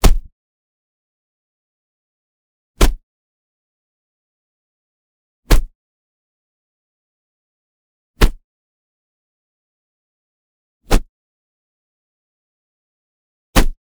FLOG Leather Hit Smack; Rock The Speakerbox; Melee

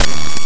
Perc, Data Mosh Hits; Other Perc; 99Sounds; Mangling Audio

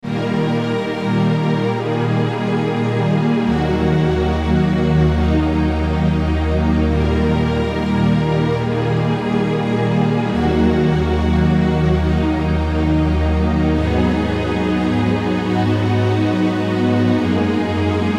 Summer String

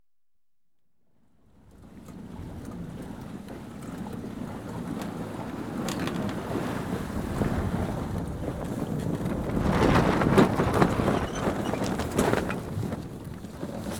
Sailing Dinghy, Going About; Ships And Boats; BBC Sound Effects Library; Ships And Boats 2

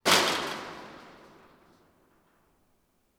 Metal Fence Strike; 99Sounds; The Warehouse

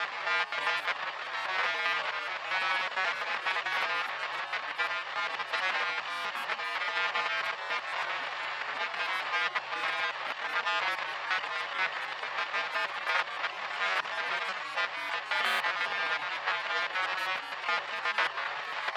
AMBIENCE SIGNAL LOOP, Dystopia; Ambience & Drone; Shapeforms

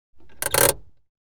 Car, Interior, Handbrake On; Auto; BBC Sound Effects Library

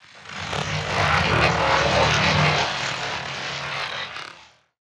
Movement; 99Sounds; InterSpace

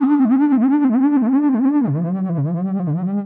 Feed rotated, Feedback FX; 99Sounds; Dark Engine

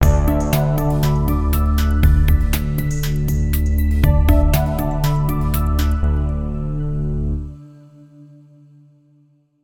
Signature Sound; FXHome; Signature Tracks

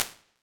Small Soft Bounce, Impulse Reverbism; Navi Retlav Studio; Lexi

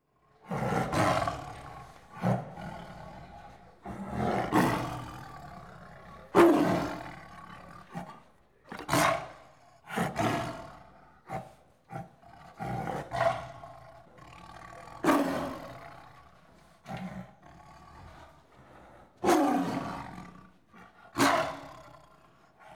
One Tiger Snarling And Growling; India Pakistan Nepal-Countrysid; BBC Sound Effects Library

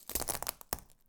Glass Scrunch; FXHome

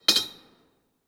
Metal Pipe; 99Sounds; The Warehouse

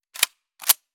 Shotgun Pump Action Full Pump; Spectral Vibrations; Gun Mechanics UK Police

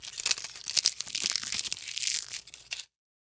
leaf crunch; Sound Design Tools; Raw Foley

